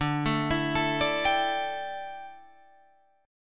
D Suspended 2nd Suspended 4th D down Guitar Drop D